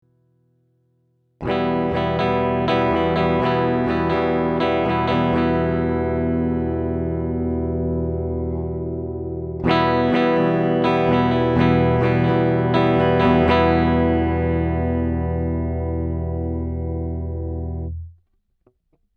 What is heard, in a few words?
FMR PBC 6A Strum Attack Low High